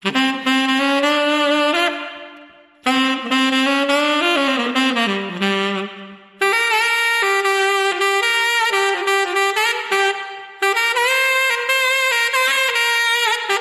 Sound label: Saxophone